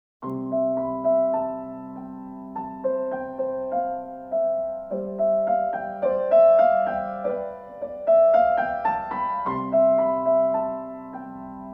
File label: piano